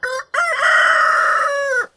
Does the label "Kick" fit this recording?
No